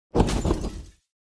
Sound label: riot begin; Shelter